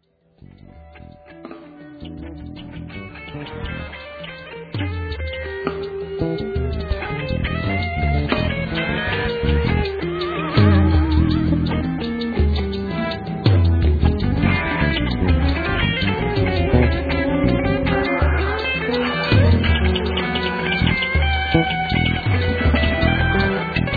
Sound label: pop Music